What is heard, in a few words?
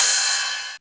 Rides, Cymbals